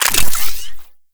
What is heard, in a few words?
Modular Secondary Handle Fast Reload, Guns; Warframe; SU Modular Guns; Reloads